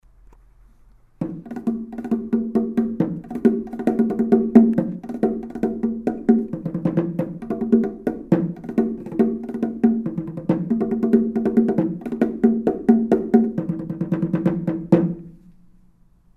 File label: bongo drums